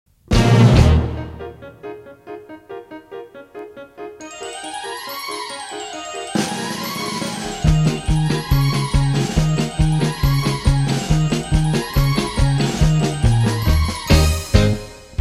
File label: Accompaniment